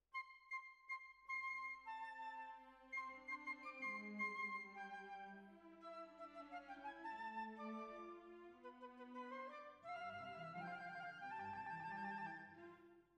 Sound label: Bassoon, Jazz for piano